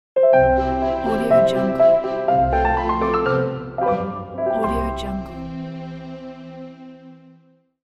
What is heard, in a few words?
classical logo; dreamnotemusic